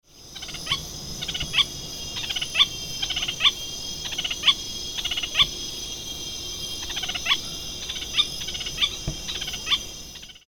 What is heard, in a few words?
Synallaxis ruficapilla Pichororé